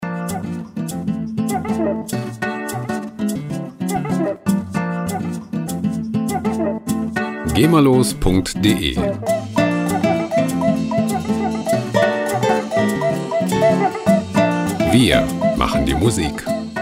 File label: brazil guitar